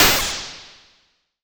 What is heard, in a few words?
Cwejman S1 - Hat; Kolp Hat; DevSnd Cwejman S1 - RD